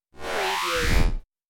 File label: alien power charge